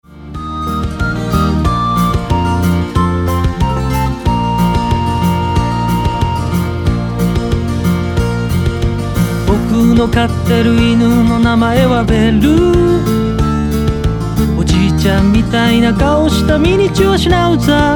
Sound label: bell